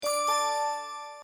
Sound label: LINE Alert simplebell lightbell